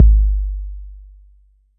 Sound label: 808's